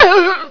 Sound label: hit; BattleSNDS